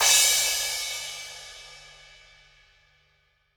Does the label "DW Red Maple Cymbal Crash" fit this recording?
Yes